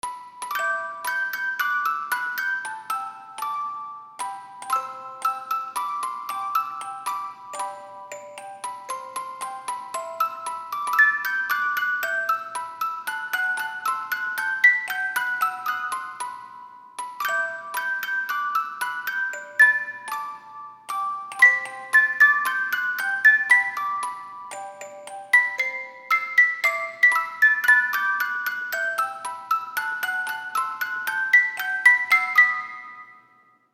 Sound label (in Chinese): 八音盒铃声